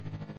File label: buzz